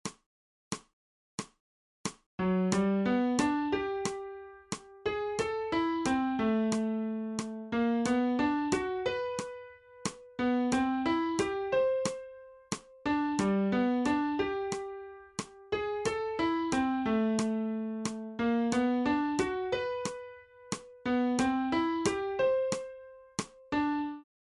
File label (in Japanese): 新フレットレス